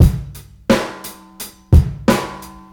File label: R B Groove 88bpm, Hip-Hop Street Beats; Sonic Foundry; Hip-Hop Street Beats Construction Kit; Street Beats by Poogie Bell